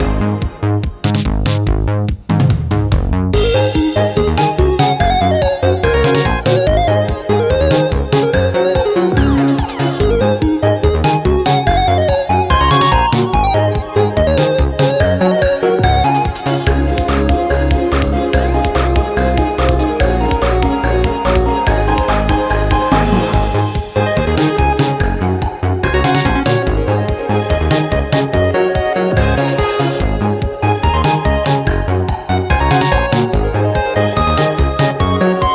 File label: Secondary Loop; Circuit Dude